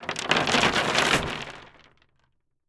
Crashes Rock Debris On Wood

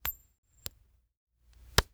Coin Toss Flip And Catch; Foley

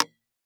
Impact PVC Pipe Hit By Wood Stick

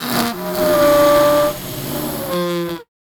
Cartoon Balloon Fart

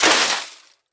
Crash Debris Glass Debris Dumping On Pavement; Crashes

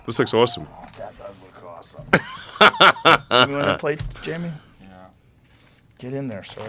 Music; Unify

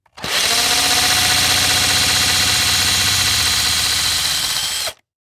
Industry Tool Power Drill Drills Out Of The Wood

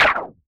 Multimedia Internet CD-Rom Flash Window Close

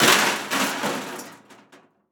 Crash Metal Tossing On Metal Debris Pile; crashes; AdobeCC

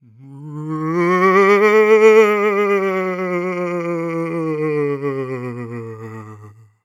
Horror Scary Ghost Moan Vampire Ghost Moan

